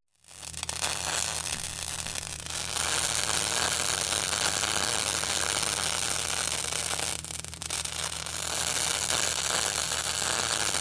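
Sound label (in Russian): сварка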